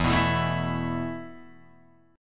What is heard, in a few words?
E Suspended 4th E, down, Guitar, Open G